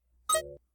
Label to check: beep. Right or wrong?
Right